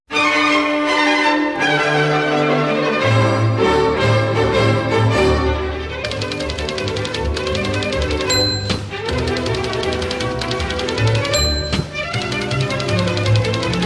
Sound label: the typewriter